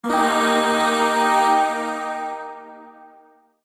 Shawzin Pent Min Chord H; Warframe; Zariman; Void Shawzin; Chords

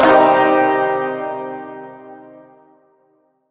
Shawzin Min Chord A; Warframe

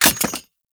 Uzi Mag Drop; Warframe; Tenno; Prime Tenno Uzi; Weapons

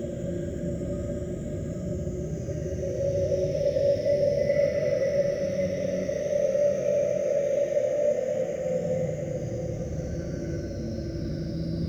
Infested Eris Interior Bed Loop; Orokin

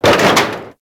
Step Metal Debris; Enemies; Steps; Warframe; Corpus; Arachnoid Camper

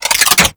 Archwing Mach Gun Equip Short; Warframe

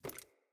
Ladder Climb Wood

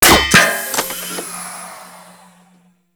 Mech; Warframe; Grineer; Ogris

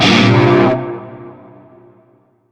Shawzin Blues Chord; Warframe; Narmer Shawzin

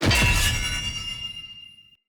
Short Sword Medium Parry Perfect Counter; Weapon Impacts; Player; Swords; Combat

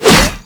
Whoosh, Weapons; Infested; Coda Caustacyst

